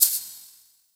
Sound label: cabasa, Percussion; realdrum